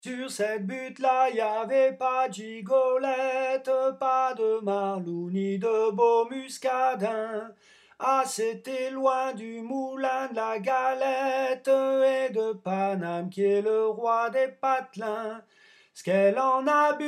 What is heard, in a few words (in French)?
Voix aigue, chorale; Butte rouge